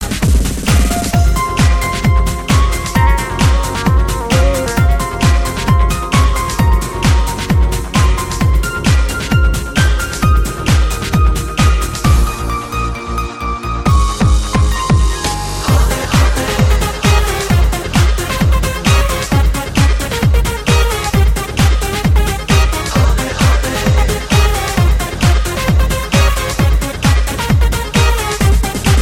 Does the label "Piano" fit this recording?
Yes